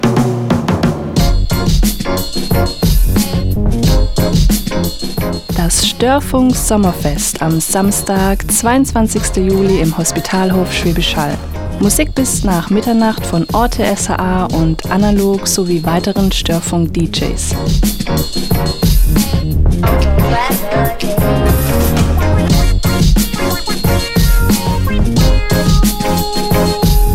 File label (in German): radio jingle sommerfest